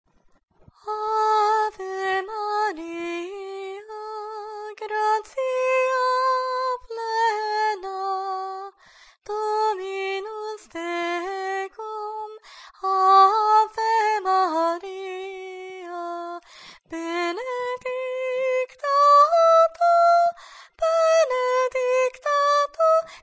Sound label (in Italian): Ave Maria - Soprani; Arcadelt; Rosario solenne